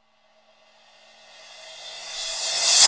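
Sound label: Reverse Cymbal; Zero-G Jungle Warfare 1, 2 & 3; 90 s Sample CDs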